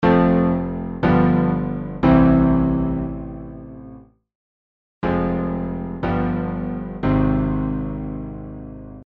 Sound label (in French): Cadence évitée piano